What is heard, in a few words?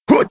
Gladiator Attack; Playable Tutorial Level